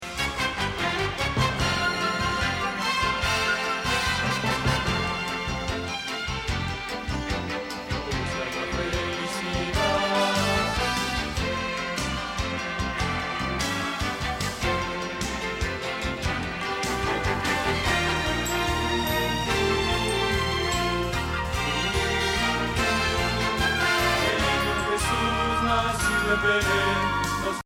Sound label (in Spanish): Bajo